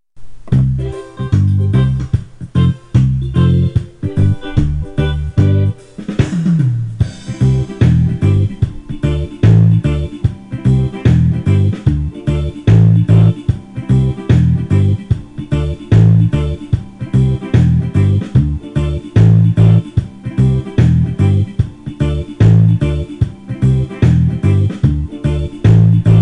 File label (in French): acc reggae